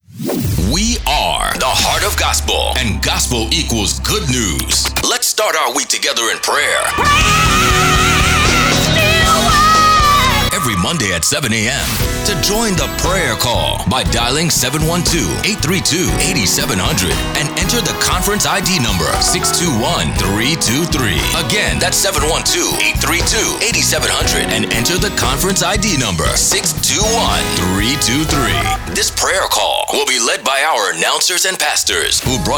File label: prayer call